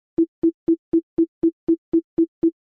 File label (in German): Tonfolge